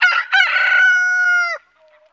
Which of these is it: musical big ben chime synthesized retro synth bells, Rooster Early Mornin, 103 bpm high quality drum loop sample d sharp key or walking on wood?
Rooster Early Mornin